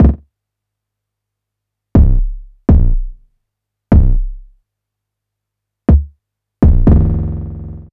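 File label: EAST bass drum, drum loop; Big Fish Audio; Uncivilised G'rooves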